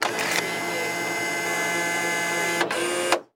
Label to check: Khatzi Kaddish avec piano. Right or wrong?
Wrong